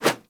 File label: Throwing Dagger Idle Light Whoosh; Warframe; Weapons